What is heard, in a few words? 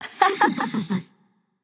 laugh